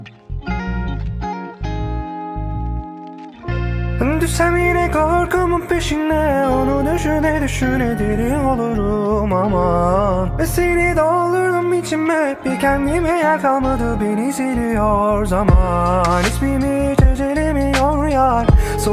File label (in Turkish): Zil Sesi